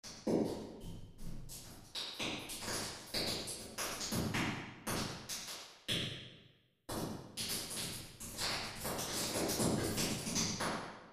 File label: Room small; dverb; reverbs